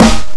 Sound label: Snare ghost note; ghostnoteMUSIC; guitar rock tour